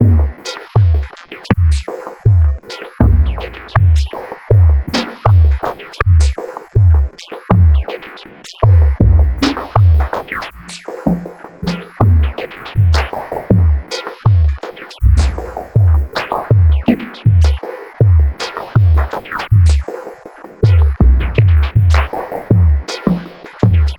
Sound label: 80 Bpm Attack Loop